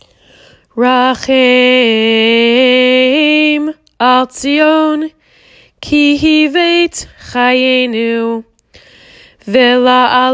After blessing

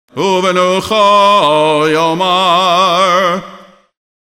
uvnucho; Cantor Recordings; Saturday

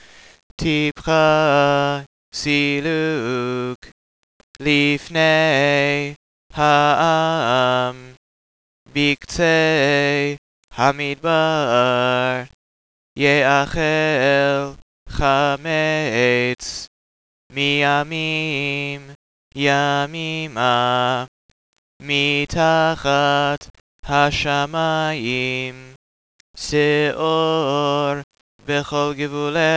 Tipha siluq

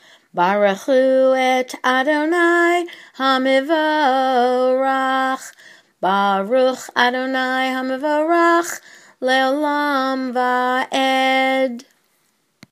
Barechu

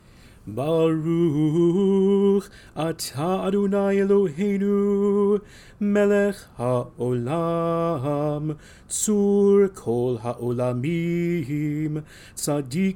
Blessing after Haftarah

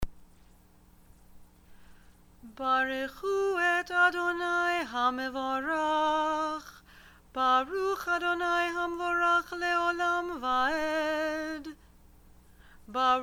Torah Blessings

